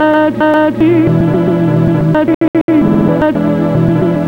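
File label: rhythm loops, 112 bpm; Rarefaction - Poke in The Ear With A Sharp Stick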